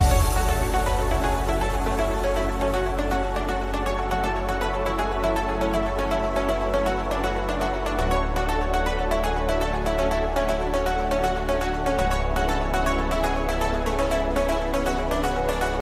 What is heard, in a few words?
deep music iphone ringtone